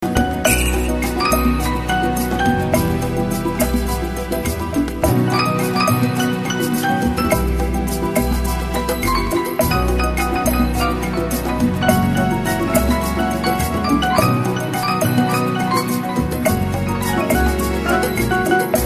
Cool Latin Jazz

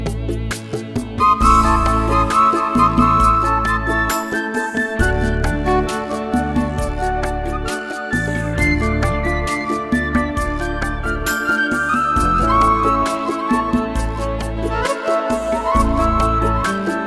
Blue Ballad

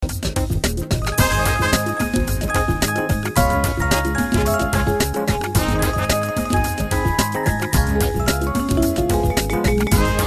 Soul

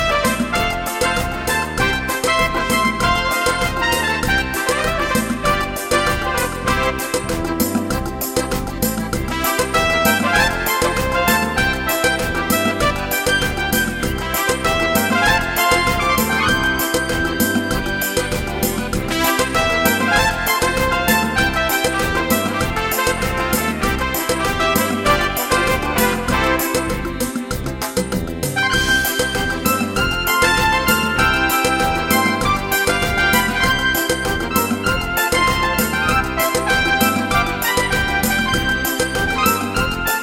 Bomba Dance

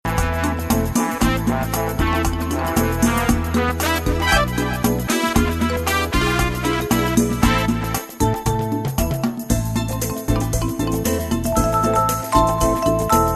Cha Cha

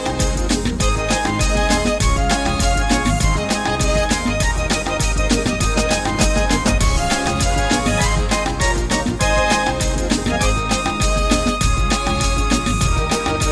Zydeco

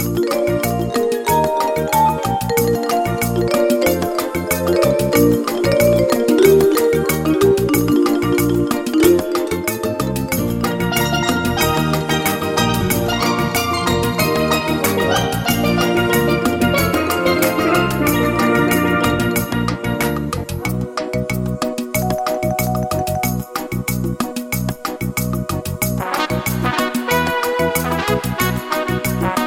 Limbo

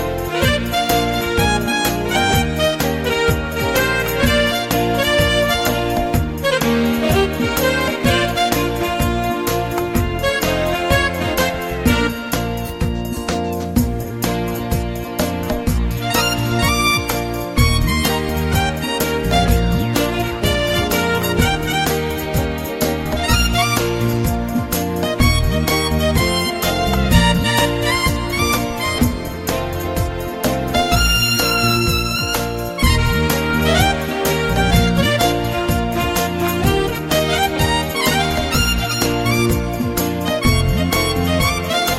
Desert Shuffle

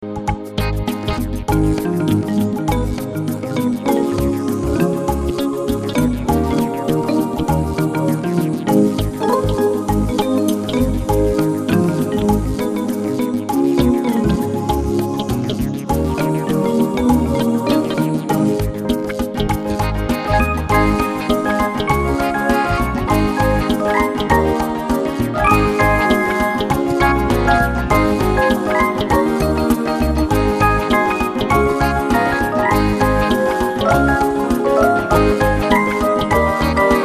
Easy Funk

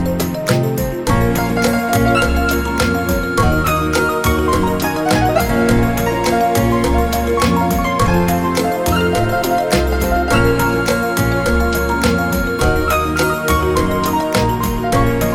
Latin Bolero

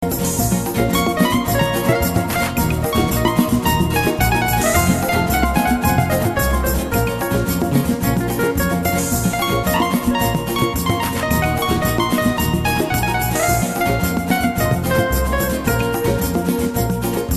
Samba Brazil

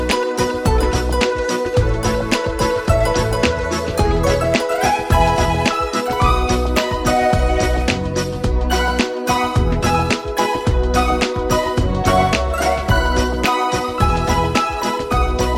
Vintage Pop

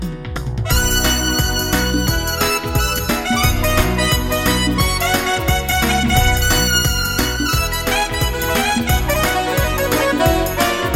Mambo

